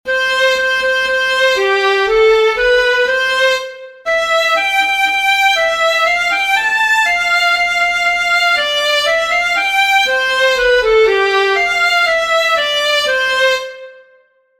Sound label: Violin And Clarinet synthetiser; transpozice hudby; A ja su synek z polanky